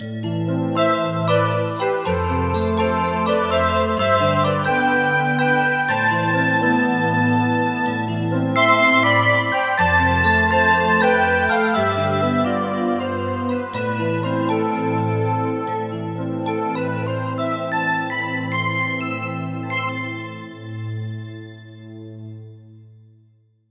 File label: fanta bell